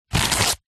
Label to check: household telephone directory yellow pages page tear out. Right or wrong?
Right